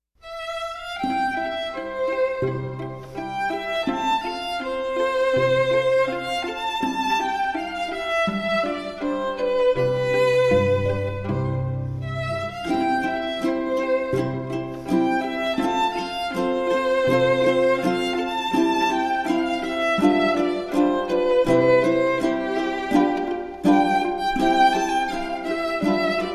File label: Ukulele Serenade; Classical Ukuleles A Collection of Favorites for Young Players; Haydyns